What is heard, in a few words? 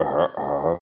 "ah ah"; talking ben